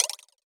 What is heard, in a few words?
Tip Off Button Hit Clack